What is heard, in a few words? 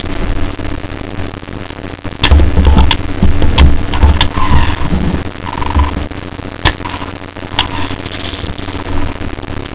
sound, elec raptor, dinosaurs; toy; lost world series 1